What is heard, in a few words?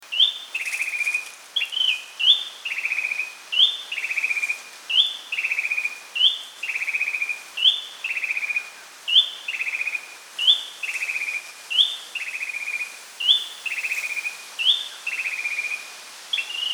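Philippine Tailorbird; Subic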